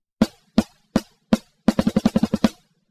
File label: snare TRANSIENT Decay 0; SplitEQ